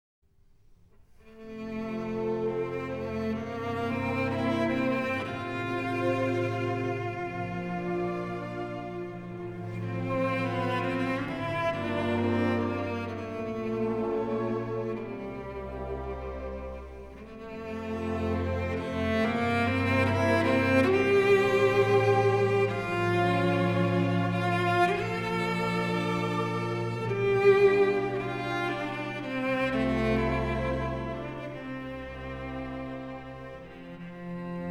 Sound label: Berceuse